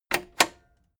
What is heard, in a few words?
cassette eject click slow heavy